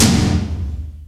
Tom, Roland D-70; Drum Samples

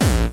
bombo, kicks, Hardcore

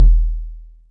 kicks, Bass Drum

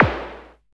Snaredrum, Roland System-100; Drum Samples

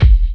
Dry bass drum, Roland MC-303 Bassdrums; Drum Samples

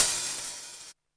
CYMBAL, Yamaha RM 50

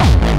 bombo, kicks, Hardcore

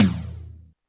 Yamaha RM 50 Toms

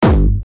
twisty bass

